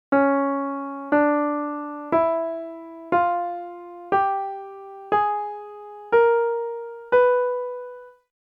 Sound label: octatonic; scale mode